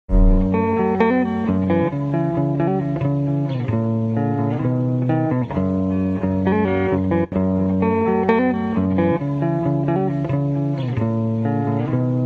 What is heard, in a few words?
Guitar Riff